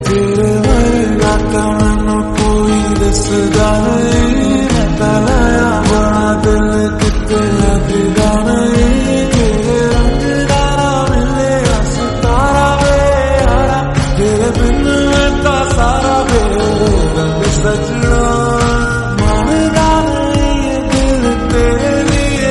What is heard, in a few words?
Lofi Song Ringtone